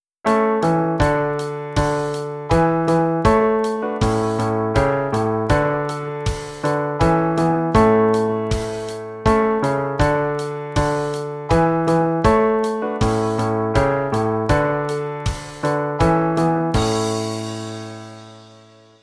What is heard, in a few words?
bass drums